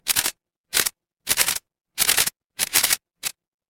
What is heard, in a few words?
office camera